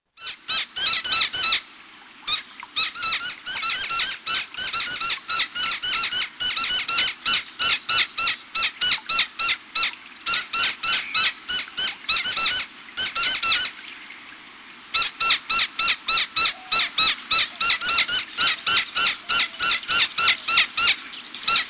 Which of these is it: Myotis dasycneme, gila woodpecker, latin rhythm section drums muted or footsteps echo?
gila woodpecker